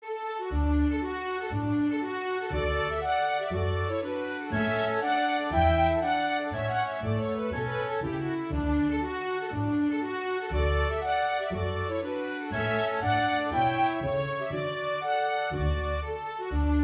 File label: Traditional Hornpipes